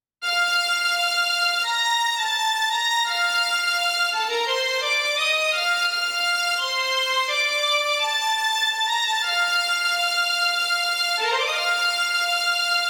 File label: studio strings on